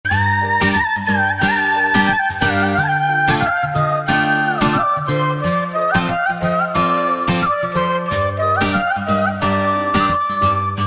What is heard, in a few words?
sharp flute ringtone